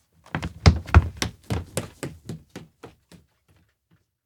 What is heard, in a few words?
steps indoor abandoned cafe run away; anemoi